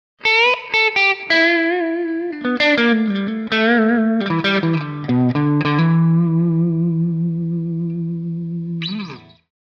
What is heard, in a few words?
Blues Guitar - Short Solo; Hollywood Edge; sound designer's toolkit v2